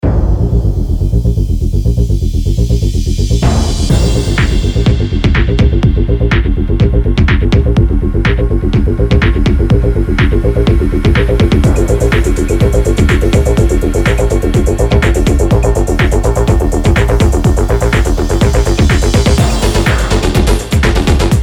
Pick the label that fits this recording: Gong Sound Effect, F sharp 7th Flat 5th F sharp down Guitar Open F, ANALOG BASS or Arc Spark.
ANALOG BASS